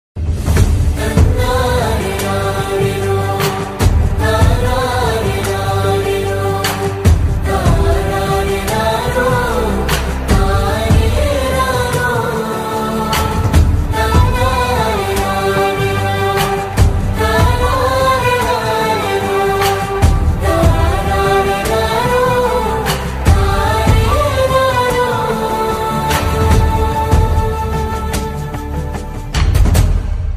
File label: emotional bgm; virata parvam movie